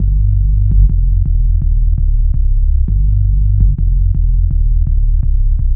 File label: Menace Throb Bass, Bass Lines 166.5; Jungle Warfare 1&2